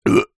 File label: human male 37 years old burp